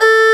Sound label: Erhu A3; Planet Earth; Digital Sound Factory Planet Earth